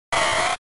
Cry

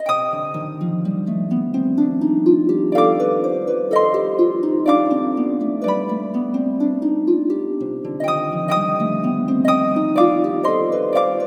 fluffy harp

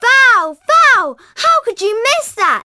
Crowd

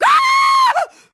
Female Scream

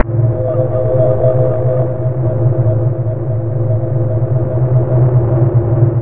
weird

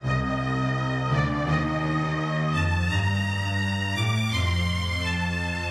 music strings; Bejeweled3

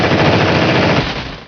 chain gang attack

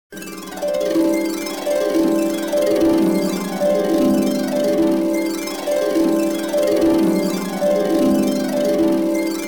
beta music

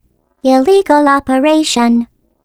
turret pickup; Portal

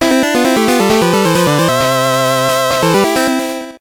fanfare; Lion King, The (Game Boy)

